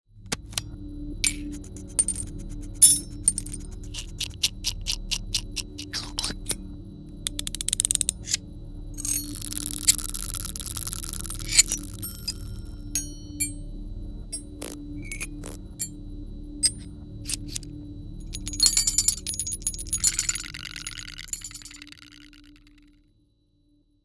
Metal Sweeteners Source; aXLsound

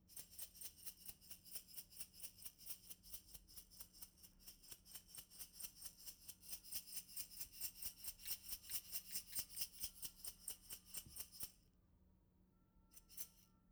SHAKE LENTIL

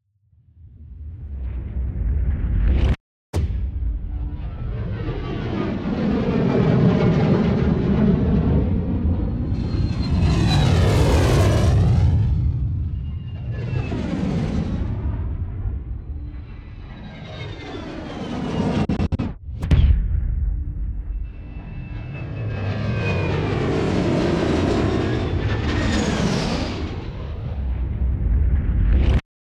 Planes Designed; aXLsound

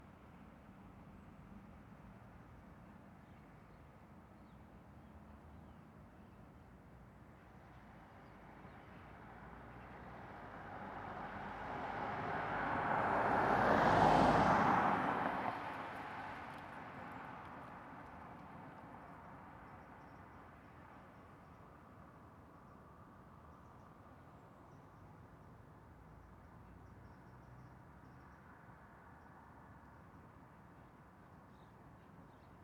close pass by A10; XY

